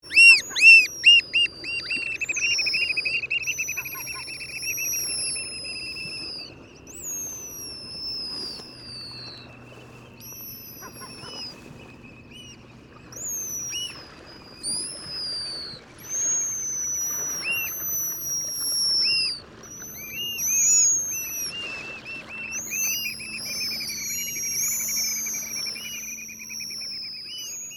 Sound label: Pigeon Guillemot Protection Island